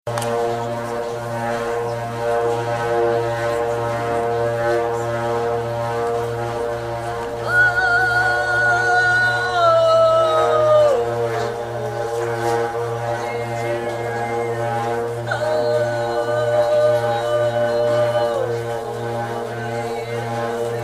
jeu de joncs

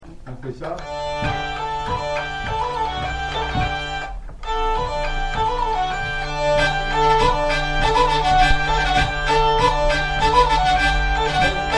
avant deux coups poignet phrase, vielle

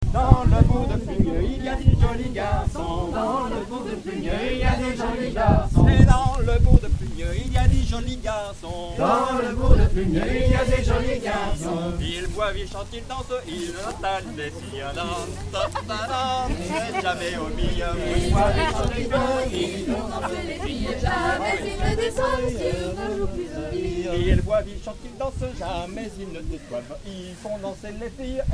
rondes violon; charivari

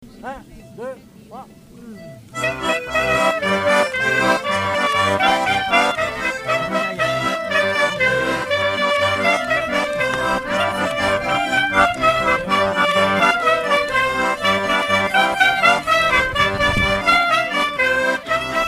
avant-deux; clar-vio-acc; charivari

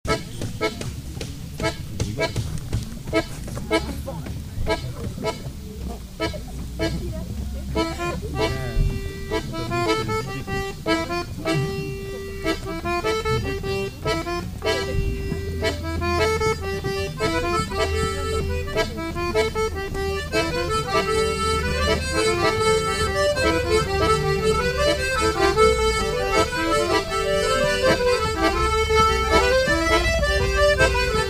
ronde accordeon; charivari

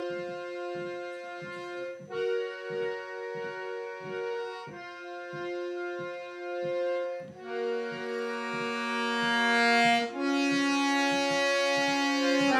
accordeons; festival

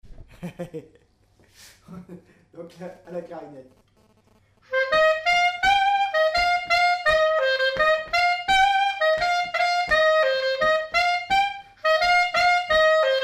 tour la plaine clar; stg clarinette

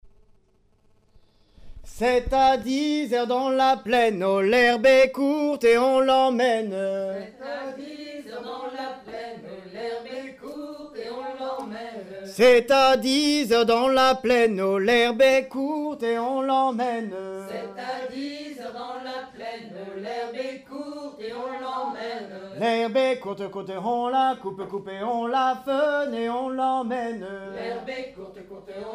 chant, clarinette; stg clarinette

